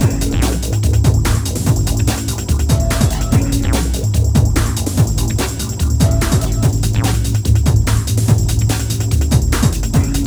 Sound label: Hardcore Breakbeat, 145 Bbmin; Big Fish Audio; Hardcore Breakbeat Action; Suspicious